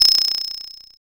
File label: FX Analog; Sound & Vision - Gigapack II